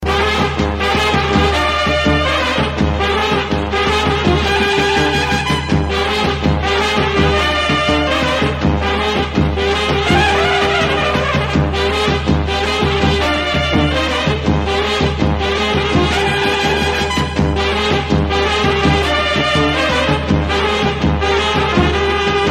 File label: Serbian Trumpets